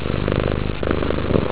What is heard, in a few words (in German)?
wuschel